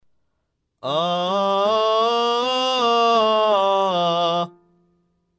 mixed voice chest voice

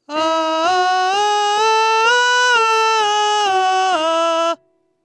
strong mix mix voise

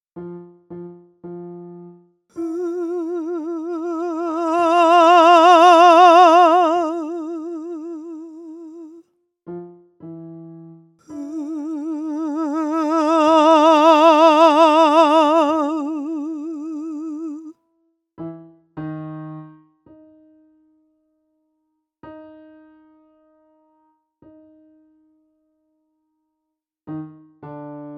practice mix voice

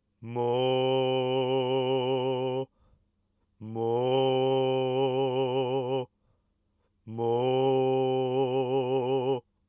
inspiration chest voice

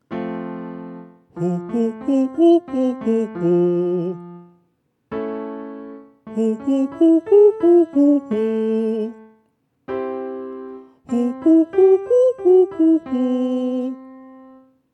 mixed voice consonants

